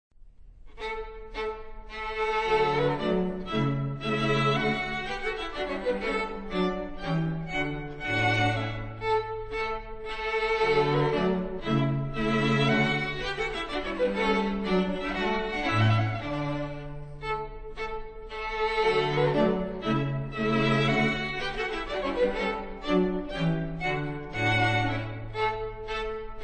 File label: Andantino Grazioso; Mozart